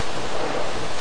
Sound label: one noise hit; LOOPING AMBIENCE